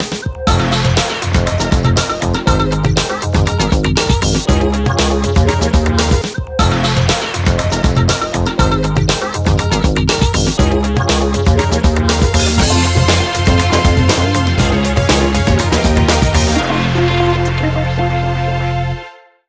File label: Music Radio